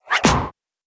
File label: slide hit